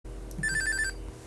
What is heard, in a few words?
parachute release, tunes; PX4-Autopilot